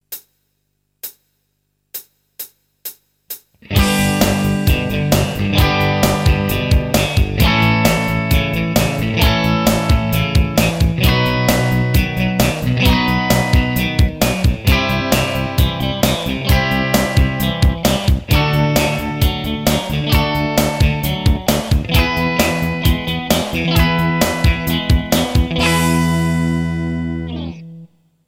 Barre Chords, guitar

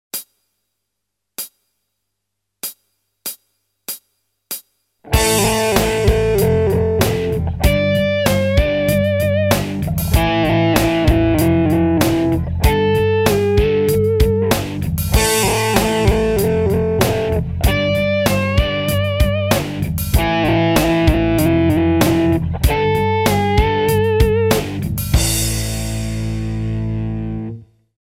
Whammy Bar, guitar

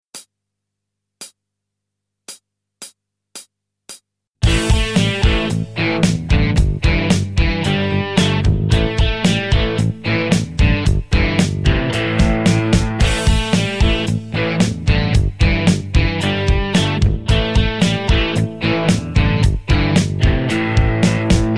Pent Riffs, guitar

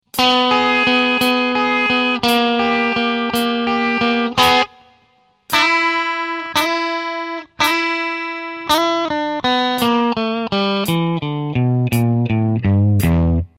Blues Intros

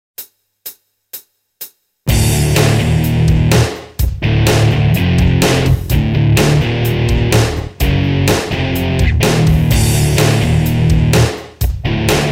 D Tuning

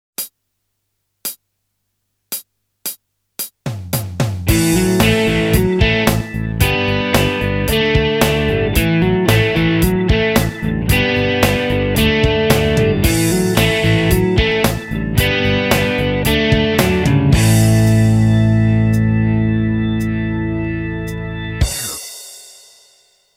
Pent Major

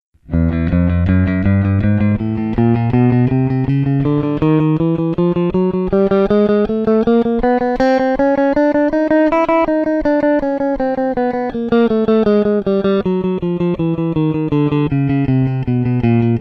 Guitar Workout

